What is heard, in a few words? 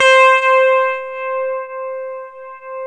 GTR, Clean E.Gtr, Ultra Sheen; Roland LCDP02 Guitar and Bass